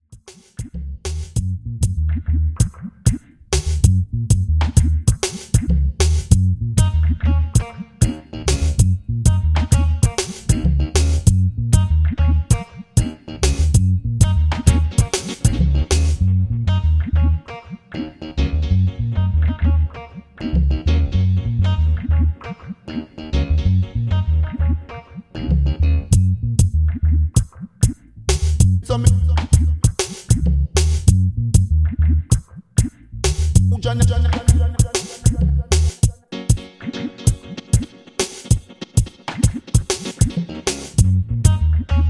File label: Reggae Explosion Dub